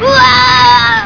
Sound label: death